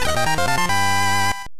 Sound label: Get Booster Pack SFX; Pokemon Trading Card Game